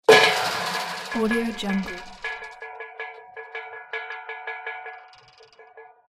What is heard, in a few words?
metal gate close rattle